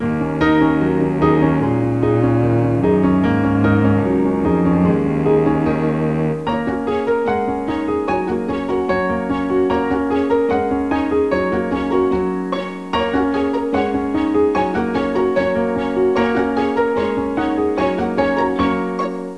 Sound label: PIANO; classical